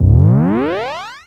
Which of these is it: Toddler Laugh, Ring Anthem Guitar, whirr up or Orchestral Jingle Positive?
whirr up